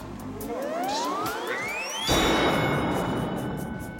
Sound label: Super bell